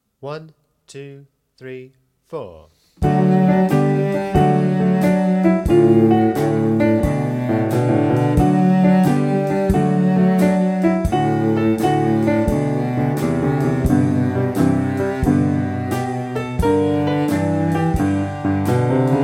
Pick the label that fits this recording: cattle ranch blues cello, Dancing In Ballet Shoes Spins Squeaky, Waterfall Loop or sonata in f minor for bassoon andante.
cattle ranch blues cello